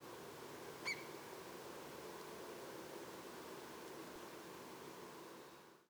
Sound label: one single note